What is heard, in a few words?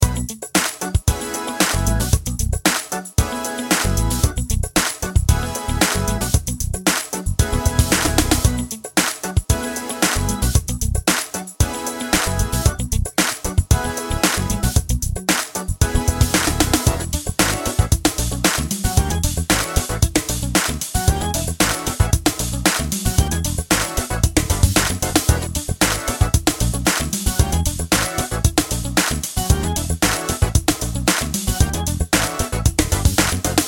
Gospel 114bpm